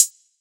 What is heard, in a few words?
Hat; 7rystan's 700+ FREE COLOSSAL EDM SAMPLE PACK; Percussion; HiHats